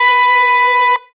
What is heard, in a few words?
electric guitar